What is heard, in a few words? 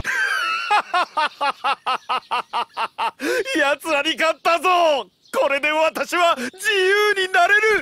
Laugh; Kira